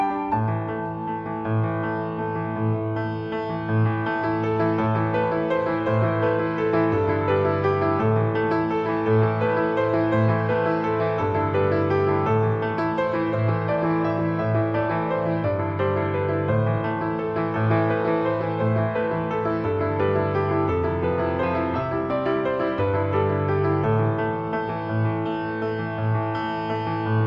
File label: celtic christmas